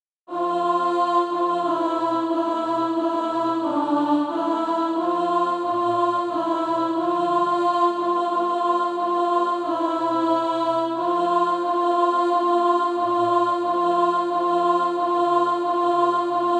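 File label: alto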